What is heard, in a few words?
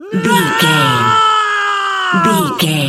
voice female battle shout